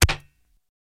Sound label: Gun Shot Silencer, silenced singles